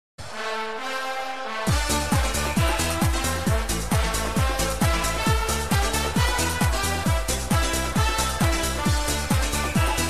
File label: goal song; euro 2008